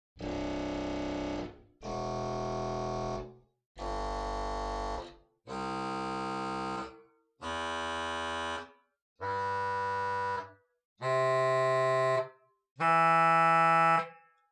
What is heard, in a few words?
Ped Fagott16 arp; Grace Lutheran, Champaign